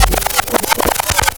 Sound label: backscratch, scratches; Zero-G; Freak Beats